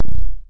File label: kick, drums single hits; iElectribe Kicks